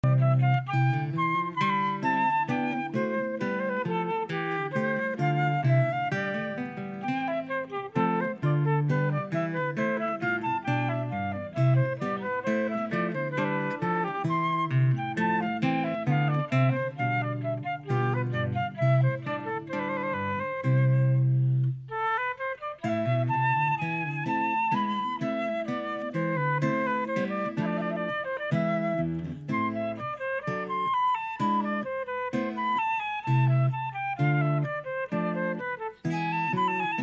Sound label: adagio gavotte